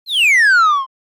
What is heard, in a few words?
Cartoon slide whistle down